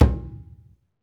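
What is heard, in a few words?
perc, skin drums; Roland LCDP14 Africa VOL-2; Af.Hand Drm2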